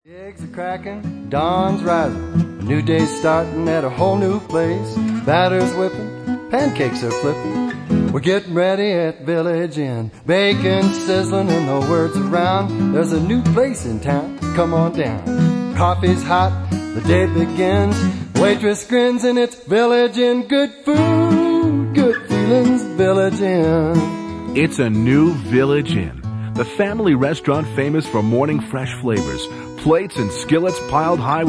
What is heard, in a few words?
jingle